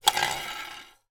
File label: toy gun plastic spin on table